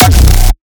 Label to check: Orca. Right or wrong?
Wrong